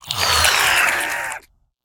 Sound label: Vilebrood Skitterer Death